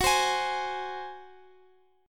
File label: F sharp Suspended 2nd Flat 5th, Guitar; strummed; JGuitar